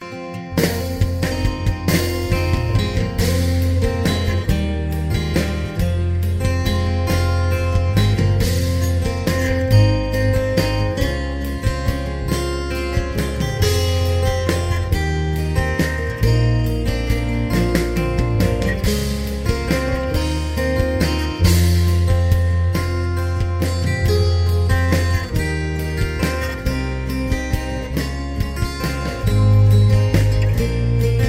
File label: Beautiful Guitar Ringtone